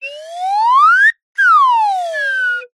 cartoon slide whistle up and down

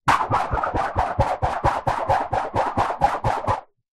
rubber cord whip through air whoosh; foley; zapsplat

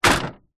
office printer compartment close hard; zapsplat

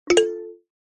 multimedia alert chime wooden mallet style; zapsplat

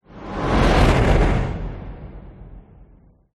whoosh; orlando lanzini

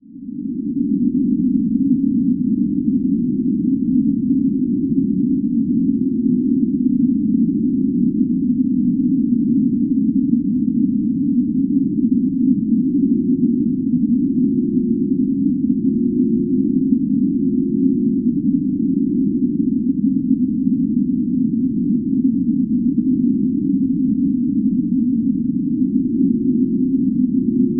sound design desolate drone

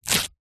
foley clothes shirt rip open fast hard

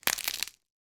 leaves rip tear; foley

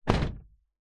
vehicles truck door close internal

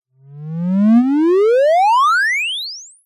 cartoon ascend rise whistle

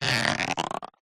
cartoon stretch pull elastic

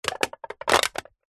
drink can empty squash

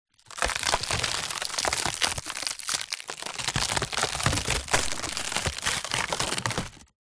impacts wood collapse break splinter

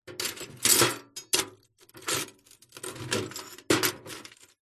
glass pieces broken rummage in metal container; zapsplat

